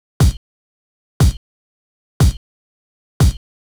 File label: kick; hydrogen